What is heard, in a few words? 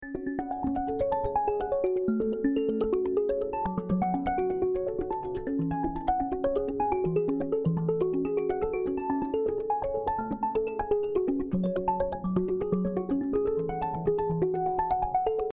Mallet